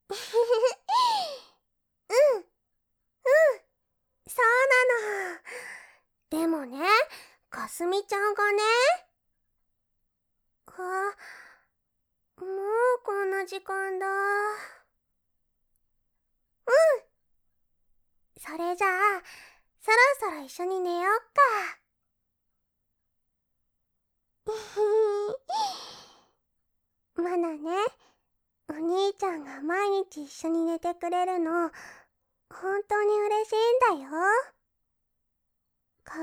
妹と寝る前のキス; 純真無垢な妹の無邪気なゆ～わく

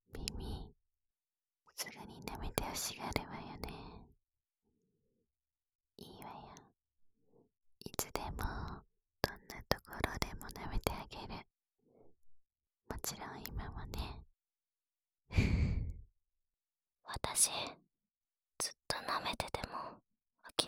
『浅い耳舐め（反転）』, ASMR

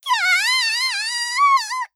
きゃああああ！, 元気

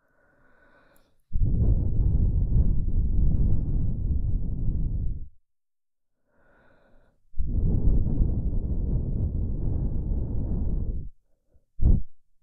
耳ふー

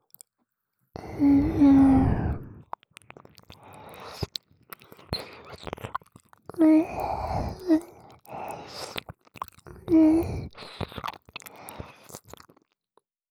れろれろ舐める, 耳舐め; おまけBGV

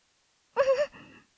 んんっ・・・; 帰れないの 本編ボイス

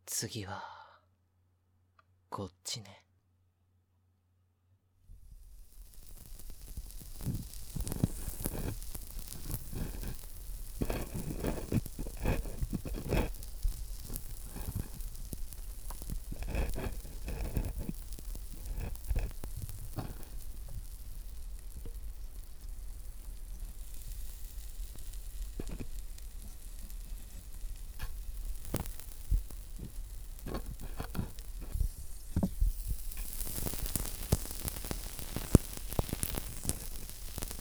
炭酸耳かき・左

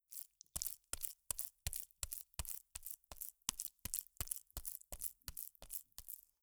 ぱんぱん; 効果音

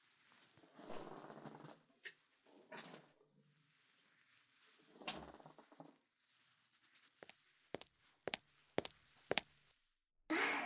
『ヘアカット仕上げ』ASMR

